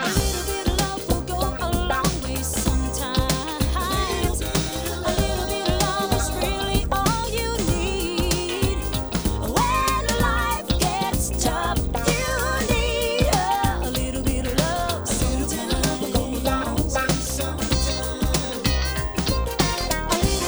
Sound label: musicmix loud